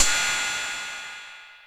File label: Cymbal 606, Drum Machines; Drum Hits; Ableton; Factory Packs